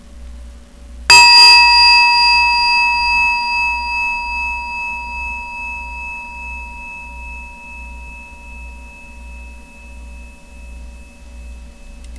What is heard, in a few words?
japanese rin small; Rin Gong Small